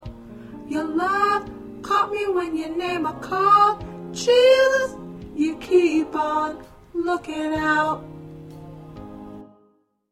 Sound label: Soprano